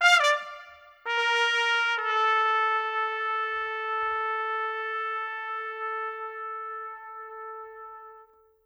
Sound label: Trumpet, Orchestral; Sonic Foundry (Sony Creative Software); Orchestral VOL-2; Modern 07